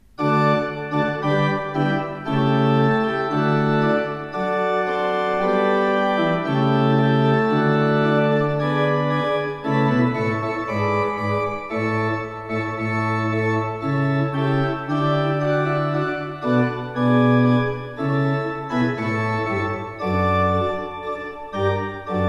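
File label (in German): Tzschoeckel 5 I, pfeifenorgeln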